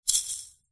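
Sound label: musical percussion shaker maracas kids single shake; zapsplat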